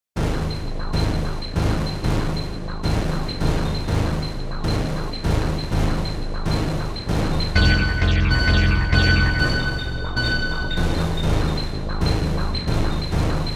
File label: analog; Universal Sound Bank; Soundscan 14 Twisted Special FX